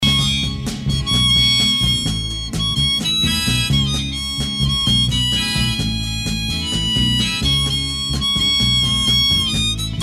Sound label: solo